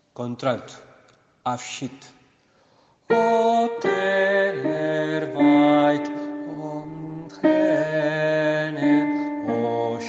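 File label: alto; Abschied vom Walde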